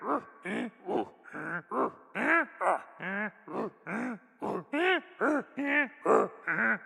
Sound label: Chant Loop; Patella